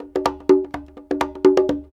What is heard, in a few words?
PERC LOOP ETHNO 125